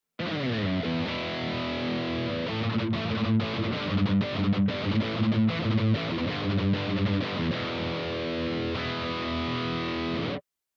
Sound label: DUAL CUBE Extreme dry